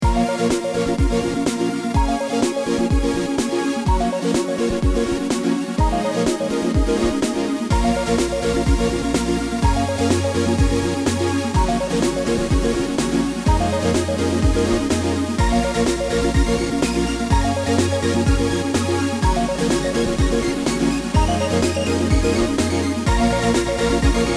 synth

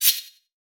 knife

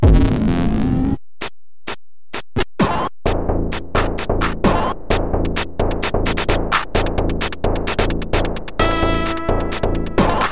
party maker 8 bit

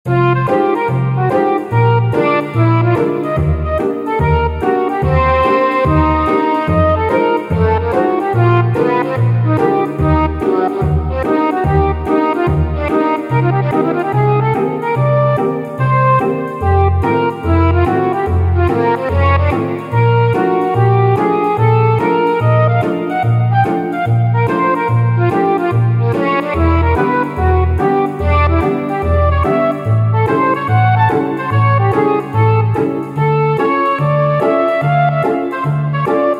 Jazz (flute)

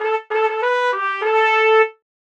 castle fanfare

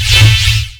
tesla sound shot

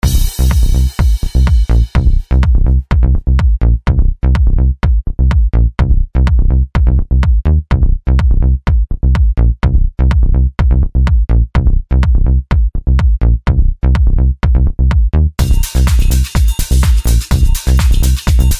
Handsome Bass

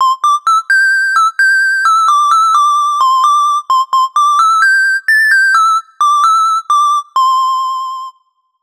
gameover

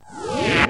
ability demonic force

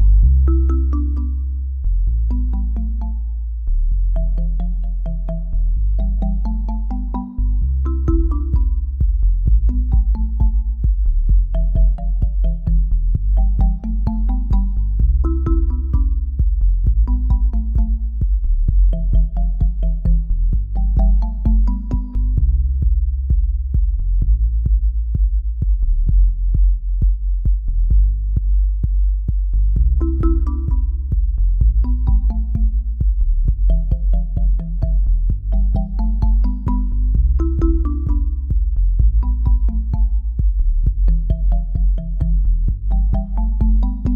feel good island loop

